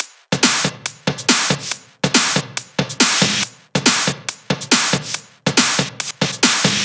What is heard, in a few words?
drum Loop 140BPM; Vengeance - Essential Clubsounds Vol.5; VEC5 Drumloops; VEC5 Multi Loops 140BPM